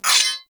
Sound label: Melee Impact Metal; Warframe; Weapons